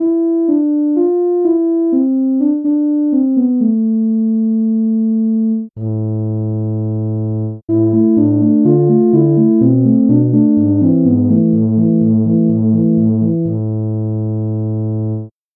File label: tuba